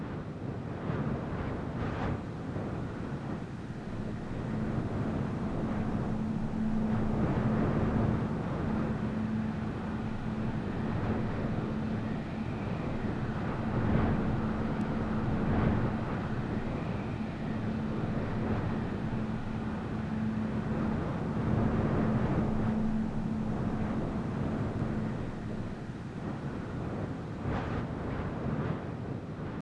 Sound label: wind